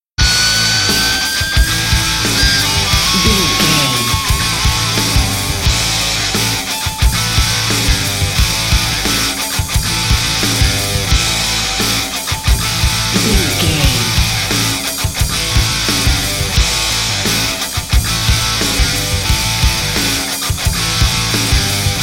Sports Rock, Pop Rock, Sports Music, Rock, Hard Rock, Metal, Driving; NFL; Football; Bikers